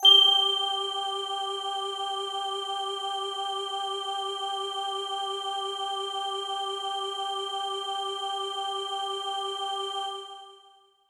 Bell Air Kawaii G-1

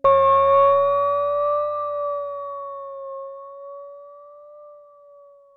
FX Twilite Bell

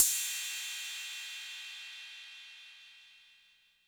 Cymbal 808 Color

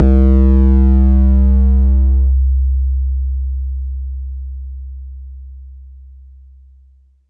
BD; TRX

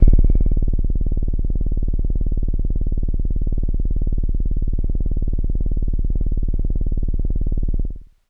Kawaii D-1; keys

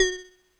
Drops Kawaii Fs3; keys

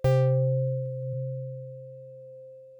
SYNTH; Medival Synzzz

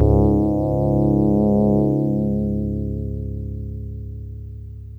Brassy synth

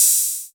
HAT

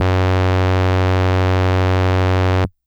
Hard Brass DX100 Fs2

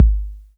BD 808 Tape Decay

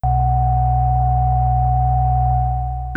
Synth, Radio tone

